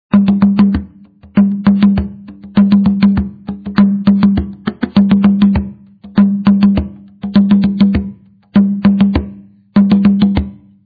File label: kpanlongo, drumming; playalong cd